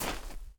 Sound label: dirt step; Golden Crayon Sounds